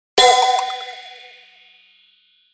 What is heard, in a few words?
Hit Cymbal Soft Clock Tick; 5.1 STEREO MIX; Blastwave